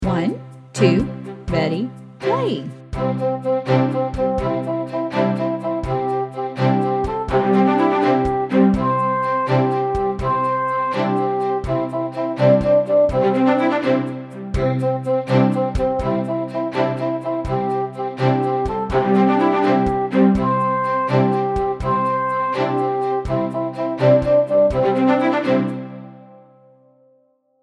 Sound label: WITH INTRO, ukulele; Little Tom Tinker